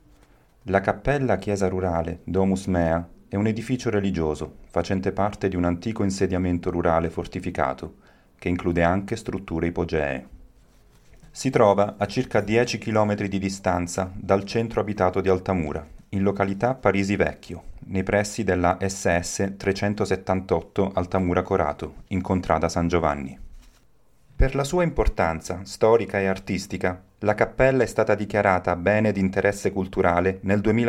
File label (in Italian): cappella